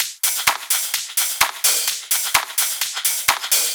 Loops 128BPM, Drumloops

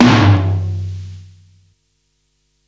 Floortom, Psychedelic Drums

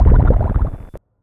Odd Stab

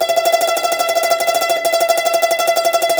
CUMBUS TR, Cumbus Tremolo

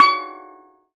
Steel Drum C#5; EMU Planet-Earth

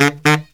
Killer Brass Riffs, BARYT

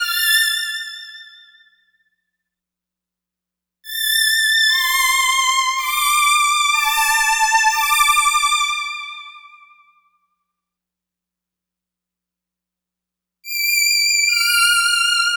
Lead, Afterhours EDM, Electro Shock; Sonic Foundry (Sony Creative Software)

